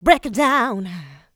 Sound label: Female Vocals; Houseworx